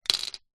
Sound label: leisure toy bingo ball drop into plastic cage; zapsplat